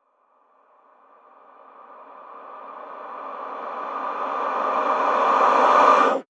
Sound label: FX Uplifter